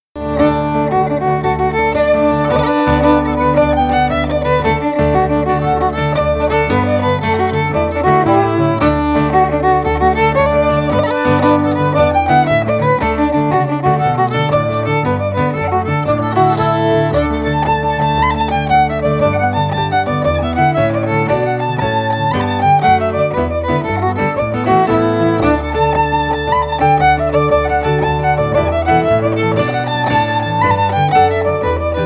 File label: fiddle; SeamusGrantFestival; tune tutor